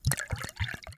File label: liquid pour; smite; medusa